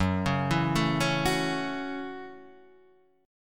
A 6th, F sharp, chord

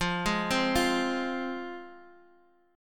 F Minor, F

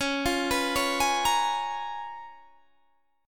C sharp 13th C sharp, Guitar, Standard; arpeggiated; C#13; JGuitar

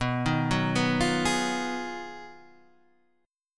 Ab Minor 13th, B, guitar chord

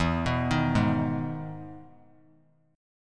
E Major 7th E down; EM7 arpeggiated; JGuitar

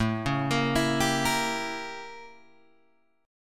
A 7th Flat 9th A, Guitar Open G; chord

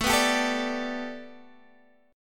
A 7th Suspended 2nd Suspended 4th Guitar Standard; A7sus2sus4 strummed

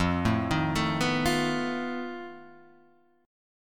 B 7th Flat 5th F, guitar chord

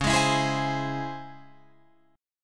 Db Minor Major 7th Db down Guitar Open F; DbmM7 strummed; JGuitar

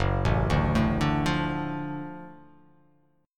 F sharp Minor Major 7th Sharp 5th F sharp down

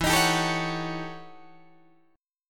E Minor Major 13th E Guitar DADGAD; EmM13; strummed

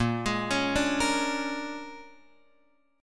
Bb 7th Sharp 9th Bb down Guitar Open F; Bb7#9; arpeggiated; JGuitar

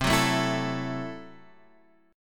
C 6th Flat 5th, C, down, Guitar, Half Step Down; chord

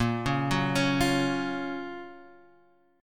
Gb Major 7th Bb, guitar

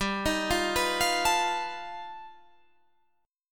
G Augmented 9th, Guitar, Standard; G+9 arpeggiated; JGuitar

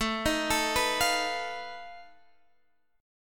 B 7th Flat 5th A, guitar chord

